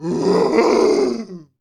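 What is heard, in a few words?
horror zombie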